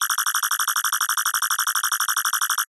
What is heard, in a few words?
science fiction console alarm